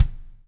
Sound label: BLUES TK, Backbeat; Spectrasonics - Backbeat